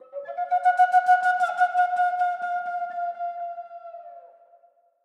bird Flute; templeofnudges

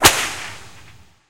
continue Button Click; wild wild west

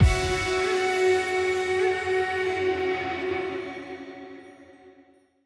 free spin Outro Shown hit; parthenon

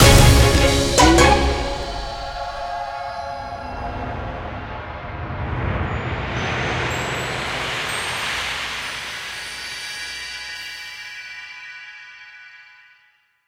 scatter Win; shangrila

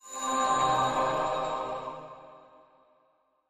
spin Start; bloodsuckers2

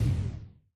quick stop; dracula

